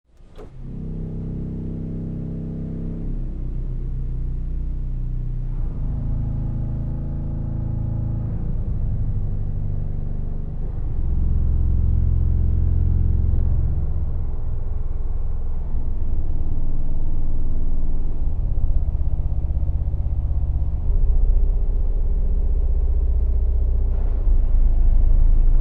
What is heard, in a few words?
64ft; atlanticcity